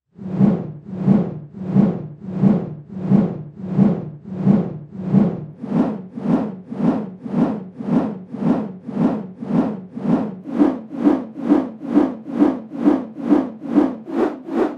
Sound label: Slow Helicopter 3d Version